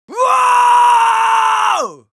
Yells, Viking Vocal

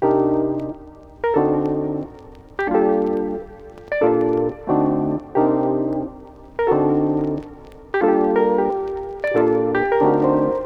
Lofi Melody Loop 90 BPM G Min Chords; Melodics; Loops; Loop Stems; Cymatics; Eternity Sample Pack

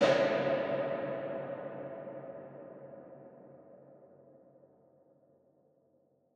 Tonal Impact C; FX; BatCave

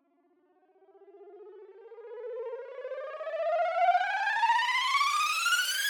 Golden Riser - 150 BPM C; FX

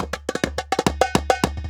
Riddim Drum Fill 140

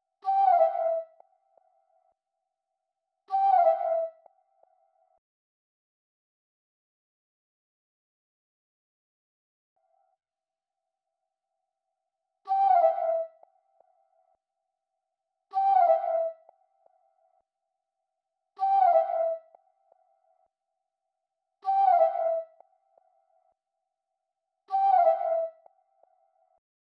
Flute Run, 157 BPM A Min; Melodies; Loop Stems; Melody Mystery Expansion; Frost

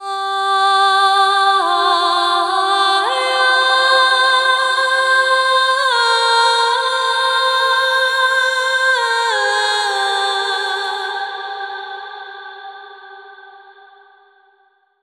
Adlib Vocal Outro - Cm 70bpm (WET); Construction Kits; VOCALS; Ghosthack; SkyRealm; Kit 19 - Ode To Daenerys

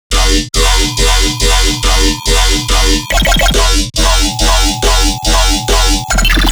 Riddim Bass Loop F 140; Spade; Ghosthack; Riddim Essentials Volume 2

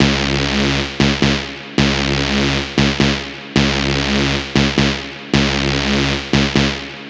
Synth Loop C# 135, Techno; Ghosthack; AC2024 - Day 1 - Techno Sounds 2025

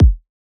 Kick A; Drum One Shots; Kicks; Cymatics; Light Free Sample Pack; Bonus Samples

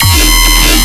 Bass Hit E, Dubstep

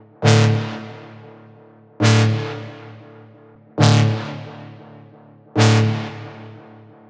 Synth Loop D 135, Techno; Ghosthack; AC2024 - Day 1 - Techno Sounds 2025

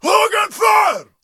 Battle Cry, Viking Vocal, Un-Processed

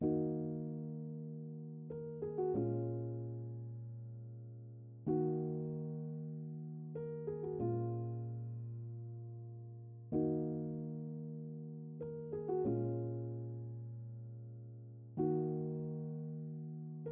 95 BPM D# Min Piano; Melody Loops; Cymatics; Hip Hop Sample Pack; Fina

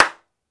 hand clap

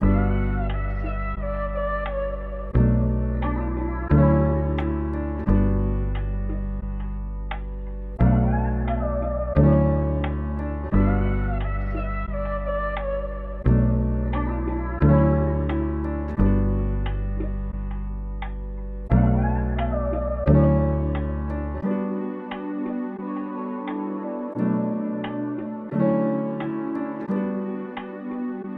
88 BPM B Min, Melody Loops; Cymatics; Orchid Sample Pack

